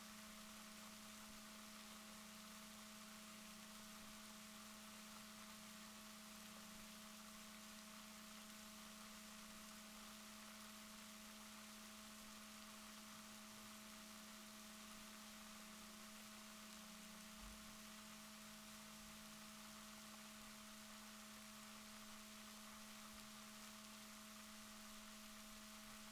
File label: rorquals positif, Balaenoptera Physalus Chile